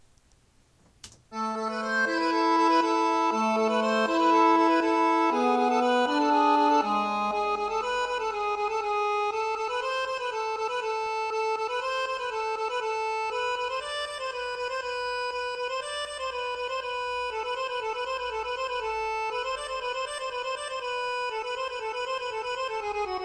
finnish tango

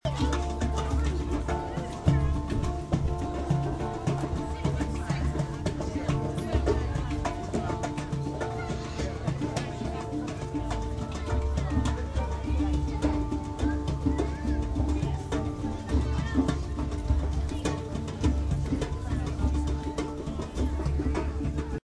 didg

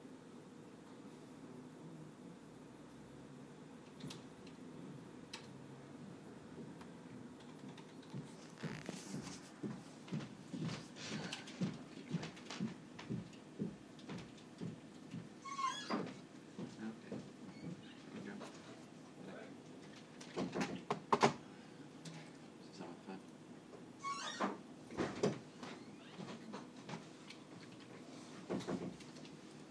quiet office

